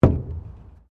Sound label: Wood Panel Thump; Material components for constructing more complex sounds; Sound Effects:Materials